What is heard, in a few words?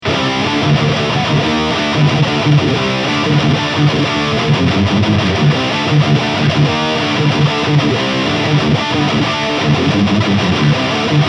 LTD Vulture OW High Gain